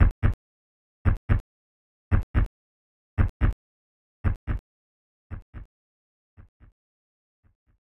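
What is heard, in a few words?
Heartbeat, game music; Rise of the Robots; SNES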